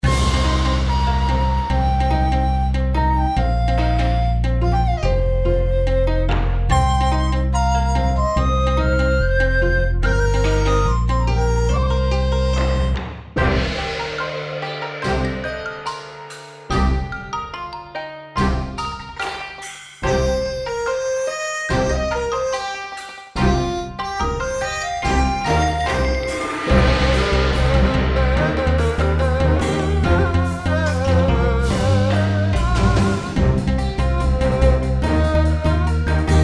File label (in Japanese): 中国 loop 144; 16bit